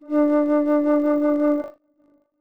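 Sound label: harmonic test signal separated; Separation of Sound Sources by Convolutive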